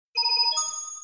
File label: blue coin; worth 5 yellow coins; Super Mario 64; Mario Coin Sound Clips